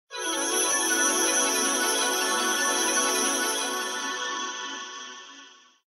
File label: mystery select loop; Magic 27